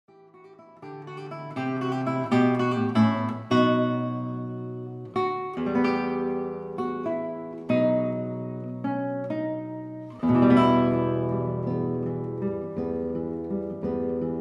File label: Variations on Greensleeves